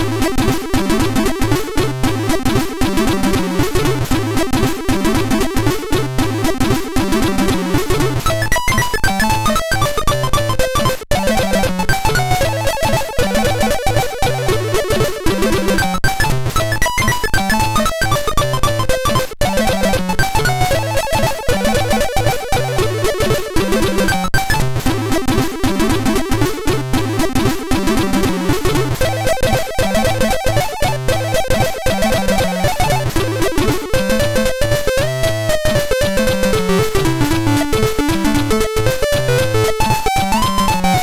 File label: Intro, sid MOS6581R2